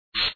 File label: spray